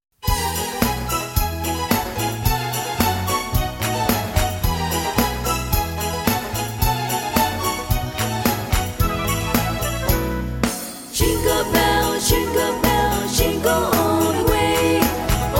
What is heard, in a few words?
Jingle Bells